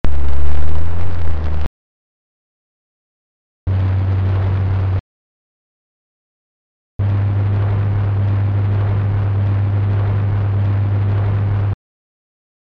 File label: Diapason 435 Hz